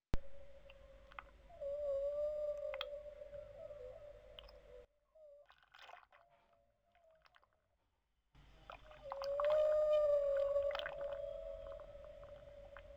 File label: whales